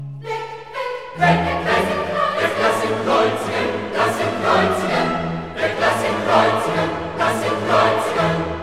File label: Coro; Weg, weg, weg!; Klassika; Handel Masterworks